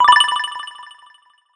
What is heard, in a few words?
Bubbles Notification; HTC Touch Pro2 And Diamond2 Stock Notification